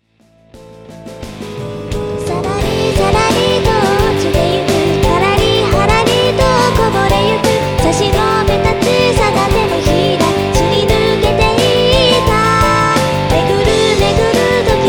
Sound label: piano